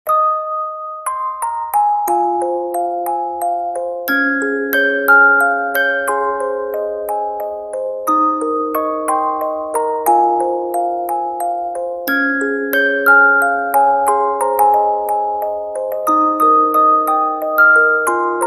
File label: Music Box